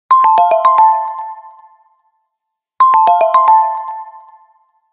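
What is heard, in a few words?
Ring, ringtone; Samsung; GT-i8700 Omnia 7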